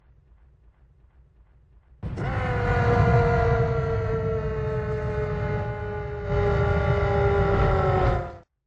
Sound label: titan roar; beast